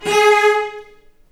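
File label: G#3 arco long cello, left; East West; The Ultimate String Collection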